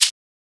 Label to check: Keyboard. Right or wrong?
Wrong